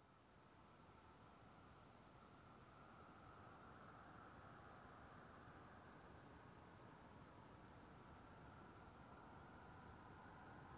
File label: Car approaching and parking